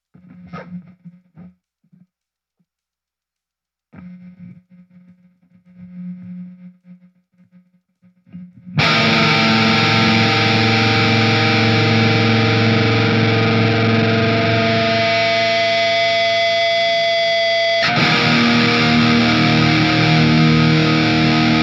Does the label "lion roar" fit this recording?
No